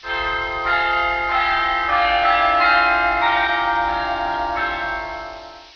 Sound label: bells